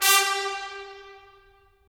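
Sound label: brass trumpets staccato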